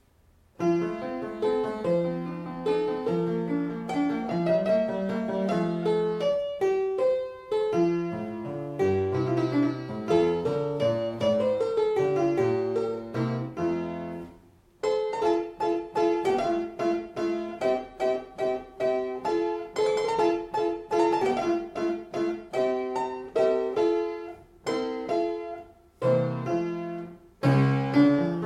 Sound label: Dulcken